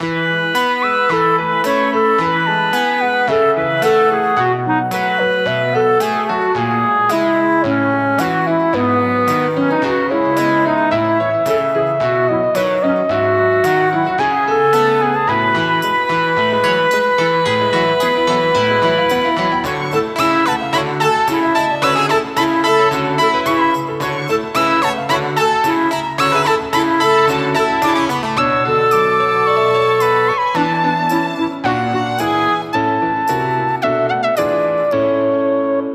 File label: MUSEUM